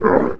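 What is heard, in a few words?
goblin; The Elder Scrolls III - Morrowind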